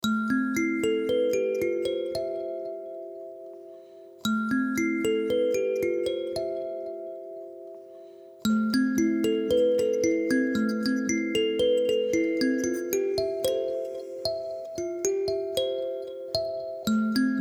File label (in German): Intro Kalimba; ZUM STAUNEN